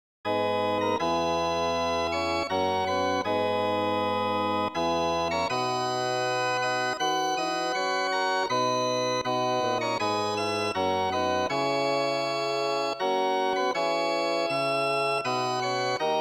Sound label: 19ET; Els Segadors